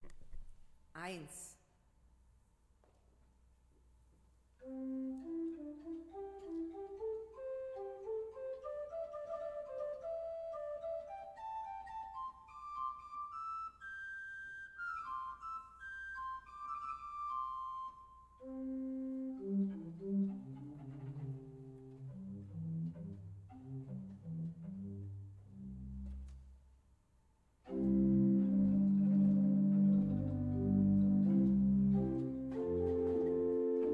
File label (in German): Weigle, gebrauchtorgel